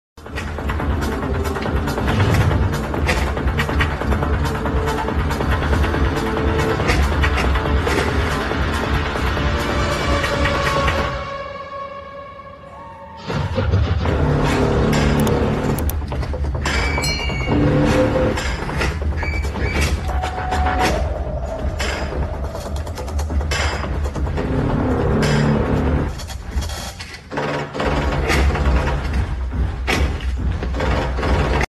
climax bgm; appudo ippudo eppudo movie

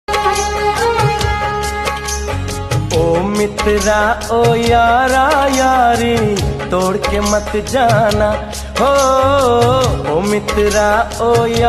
emotional ringtone; friendship emotional

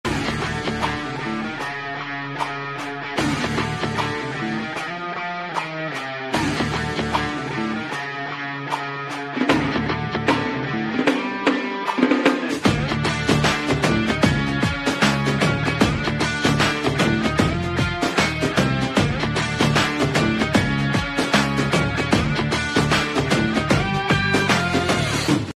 song bgm ringtone; pushpa 2 the rule movie

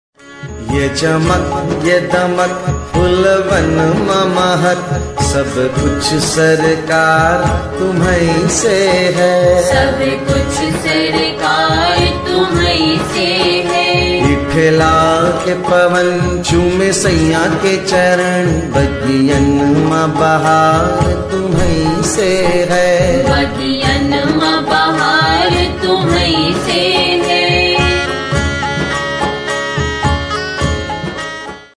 indian ringtone, hindi ringtone; Hindi Movie Ringtones & BGM

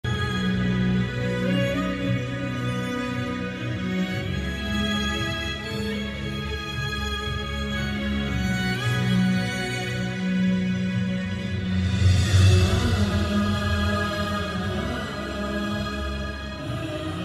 climax bgm ringtone; amaran; sai pallavi

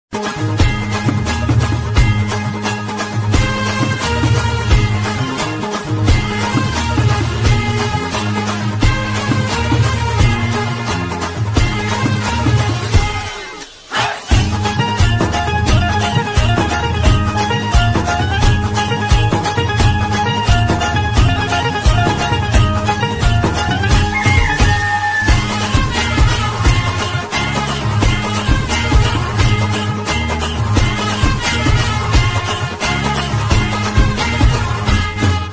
banjo music

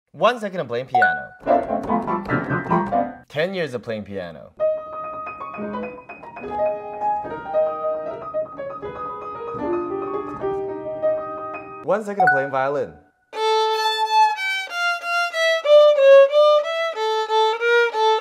playing violin

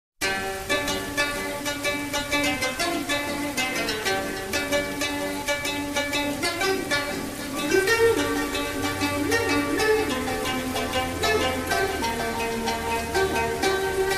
love rain ringtone; paiyaa

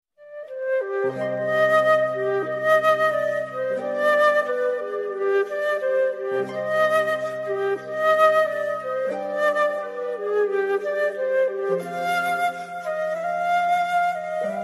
madharasapattinam love feel bgm, tamil

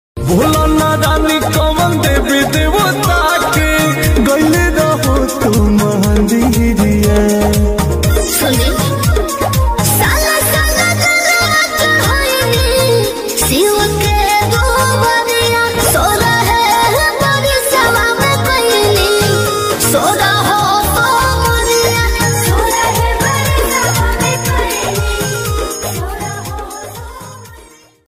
bhojpuri bhakti ringtone song; bholenath

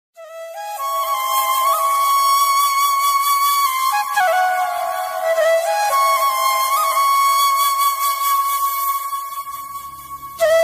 bgm tamil

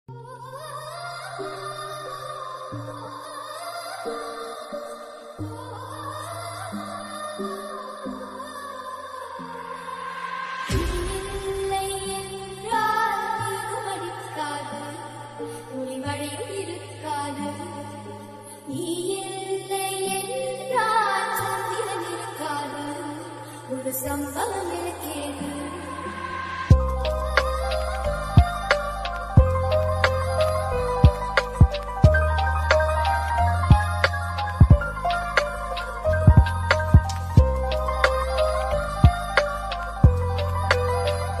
bgm ringtone tamil song bgm

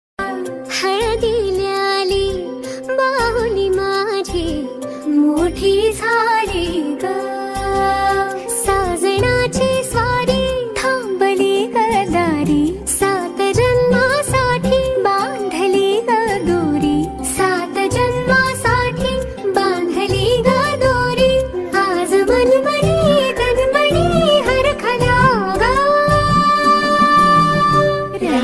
vat purnima song, marathi

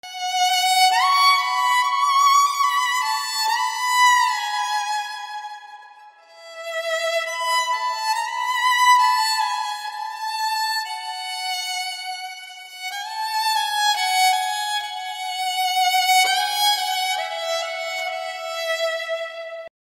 violin music; mohabbatein

